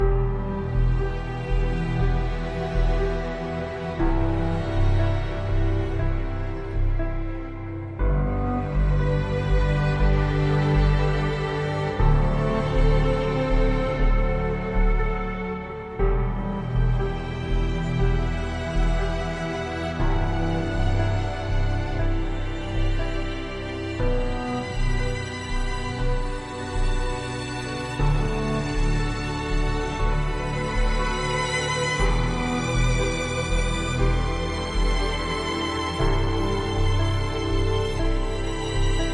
Lovesick Ambient

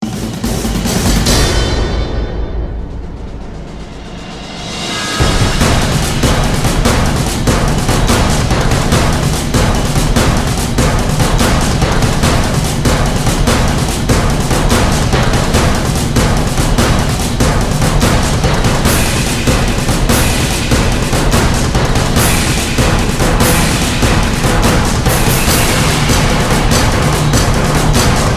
Surprise Attack (Drums Only Version)

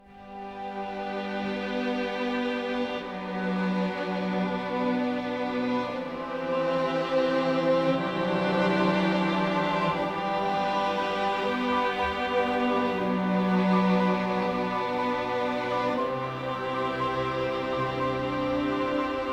Bond Ambient

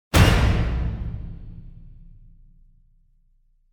Parkour Strike; Gothic Storm Music

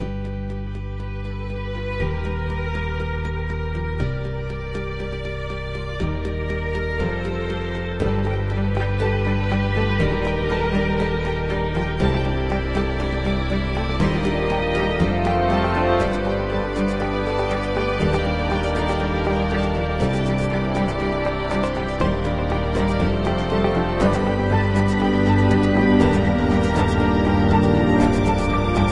Triumph Ambient

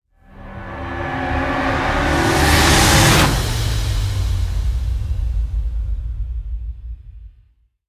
Rise N Fall Tonal; Ninja Tracks

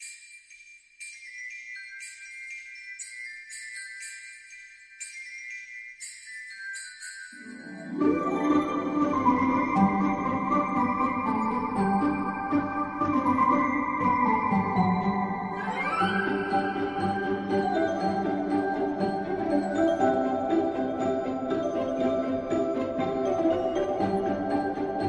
Twinkling Ambient

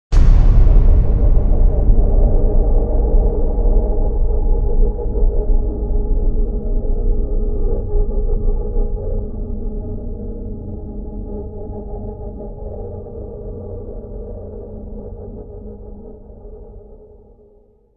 Boom Drone; Epic Tools vol. 1

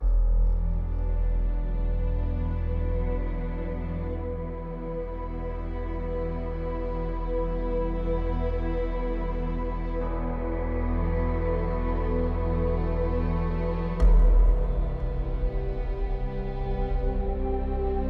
Defeat Ambient

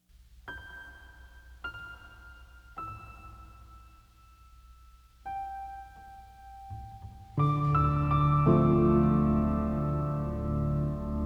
Relaxing Piano Lullabies

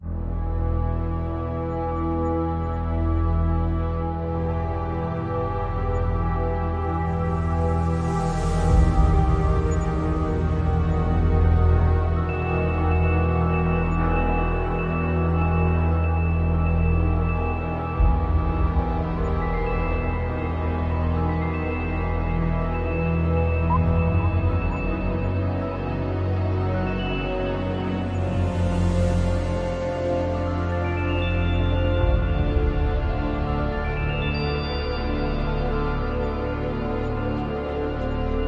Desolation Ambient

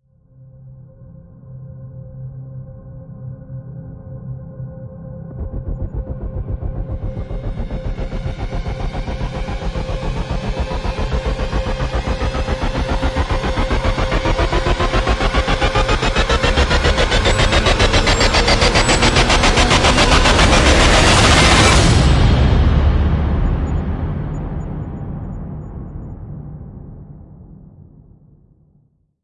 Rise; Ninja Tracks

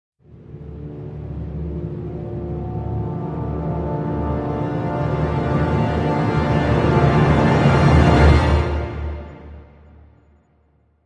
Cres Grand Finale Maj; Brand X Music; Brand X Music Catalogue - Editor's Essentials

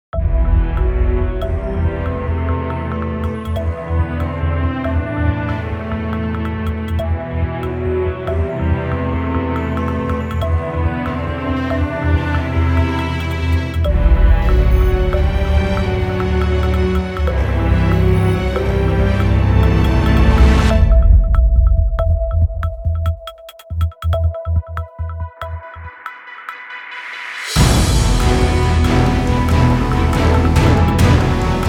No Vocals

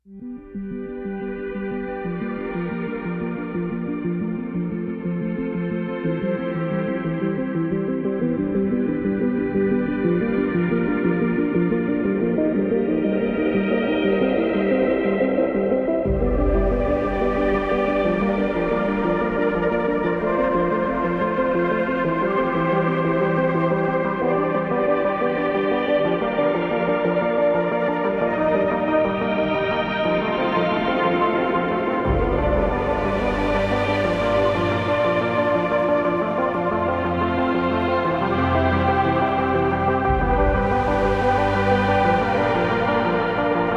Ambient

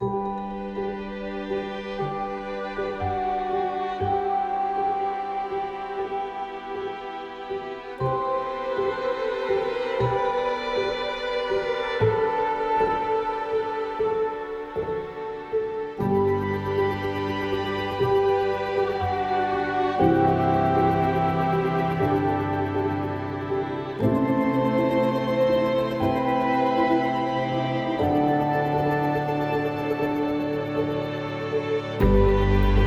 Meaningfulness Ambient

